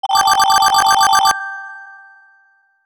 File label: casino slot machine